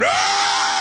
bahh

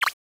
button click; scribblenauts

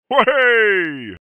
wahey

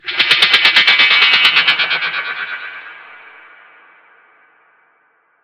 sci fi singing sword reverb

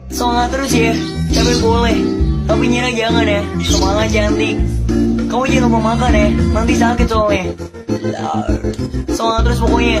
rawrrr

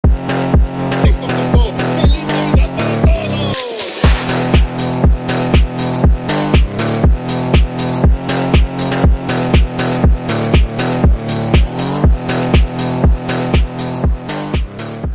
christmas phonk

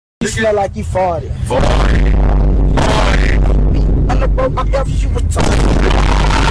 farted

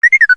r2d2 censor

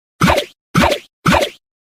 shoot; dart goblin

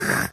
static sfx

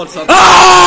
screaming

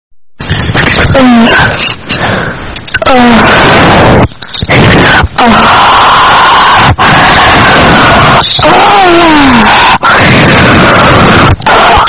screaming; toki

